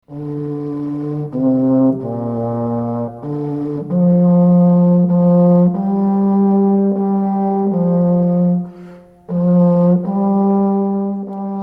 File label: serpent; instruinsolites